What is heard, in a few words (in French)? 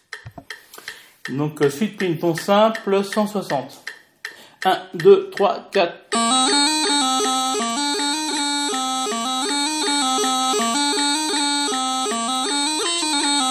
SUITE TON SIMPLE PLINN